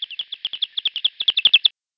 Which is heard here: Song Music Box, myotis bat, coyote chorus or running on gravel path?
myotis bat